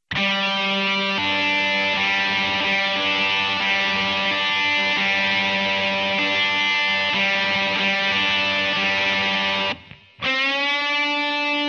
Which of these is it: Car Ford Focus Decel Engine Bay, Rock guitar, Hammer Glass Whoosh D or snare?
Rock guitar